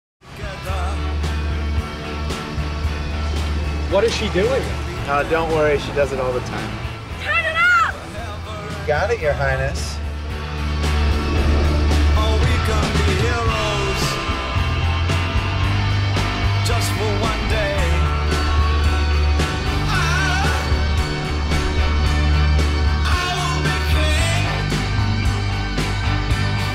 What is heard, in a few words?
tunnel song